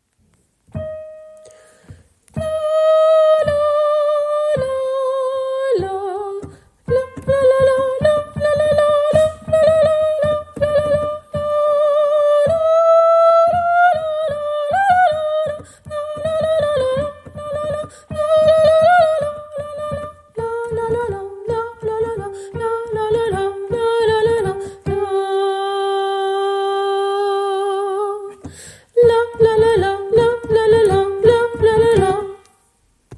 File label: shchedryk tenor